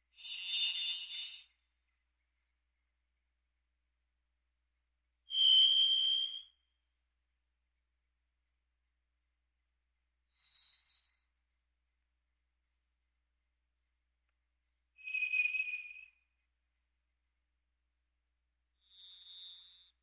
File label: Birds